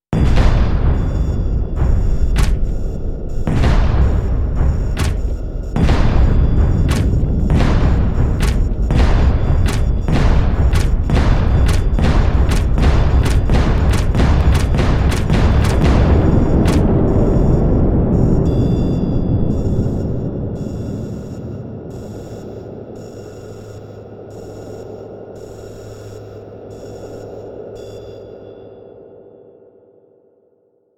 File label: skeleton clocks, soundscapes